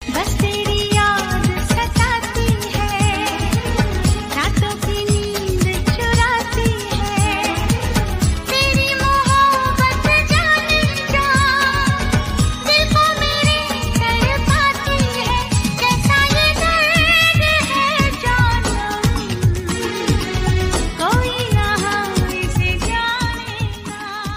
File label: Love Song Ringtone